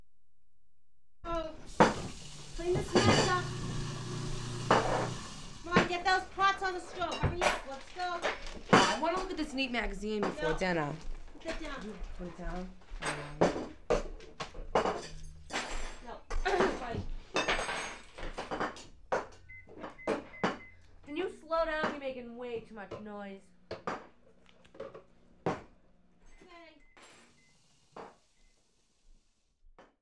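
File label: kitchen noise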